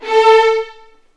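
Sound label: A4 violins; The Ultimate String Collection; East West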